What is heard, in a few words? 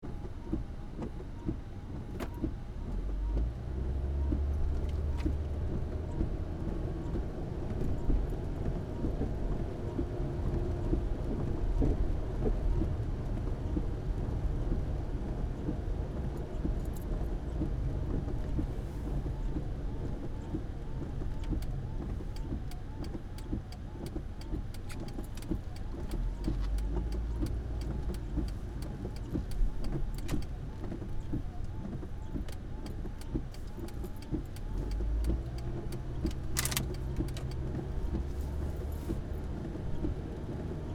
interior car in rain with wipers